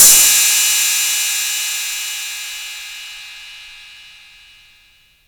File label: crash single hit d sharp key, Royality free crash cymbal single hit tuned to the D# note; drum sample; one shot